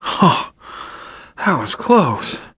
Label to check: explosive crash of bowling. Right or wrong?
Wrong